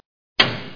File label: HAMMER